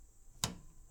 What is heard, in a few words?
Old Tv Radio Switch